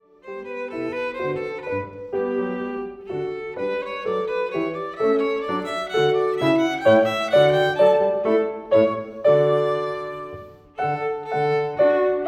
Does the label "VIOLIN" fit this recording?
Yes